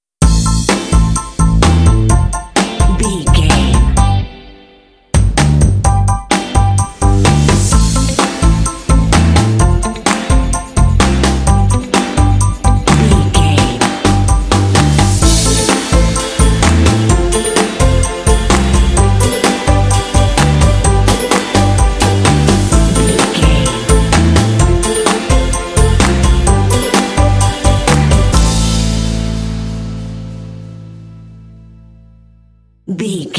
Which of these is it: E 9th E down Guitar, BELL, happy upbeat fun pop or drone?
happy upbeat fun pop